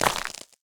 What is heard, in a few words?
land, footsteps; project-thought-experiment